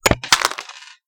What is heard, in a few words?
Fall